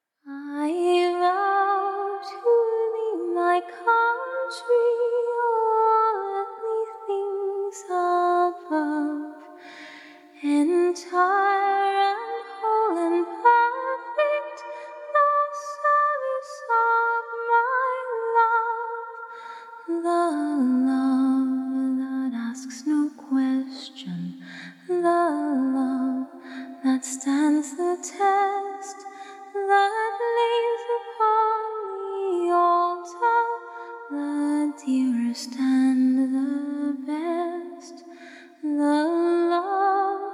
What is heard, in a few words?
acapella hymns